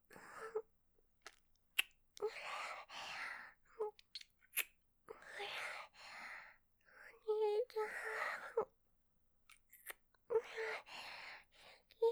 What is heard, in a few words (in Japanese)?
キス (ラブラブディープキス); 純真無垢な妹の無邪気なゆ～わく